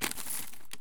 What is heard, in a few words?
flakes foodtake; Escape from Tarkov